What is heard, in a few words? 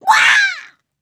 death flying, grunt involuntary; covy involuntary